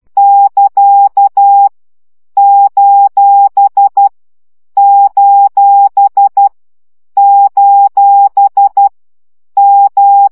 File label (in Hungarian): CW gyakorlas kettospont 10 baud